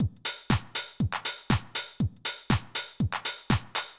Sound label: House Drum Reverb